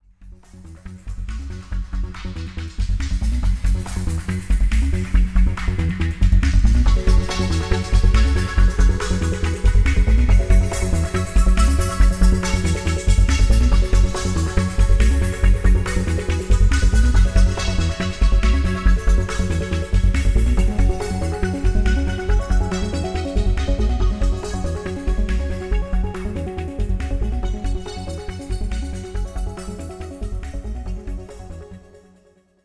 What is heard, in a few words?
pop groove